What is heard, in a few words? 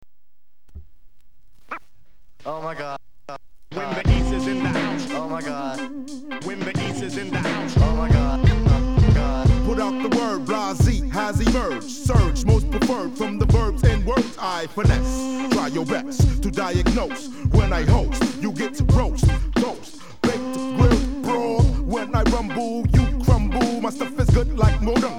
hiphop